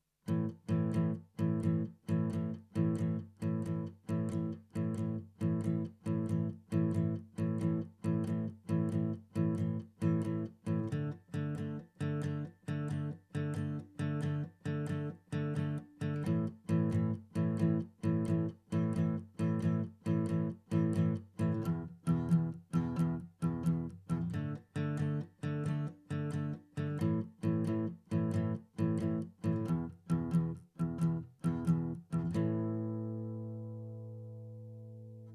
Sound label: shuffle power chords